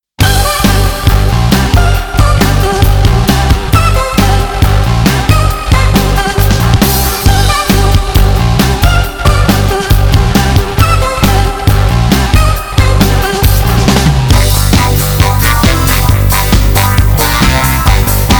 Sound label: Fuzzzy Buzzzy